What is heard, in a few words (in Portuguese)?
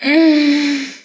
vocal one shot gemido